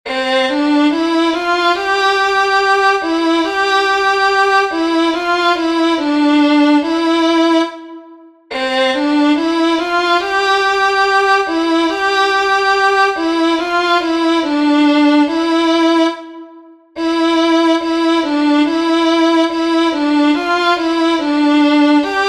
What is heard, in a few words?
hang